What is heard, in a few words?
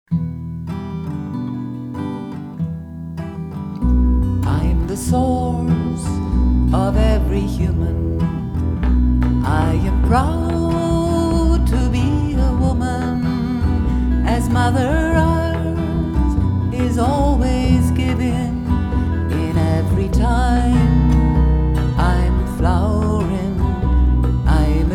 Woman Song